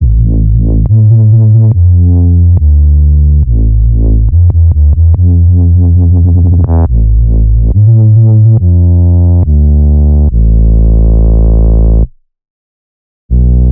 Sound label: Bpm140 Spring Bass Loop Am, Dubstep; Prime Loops; Dubstep Illusions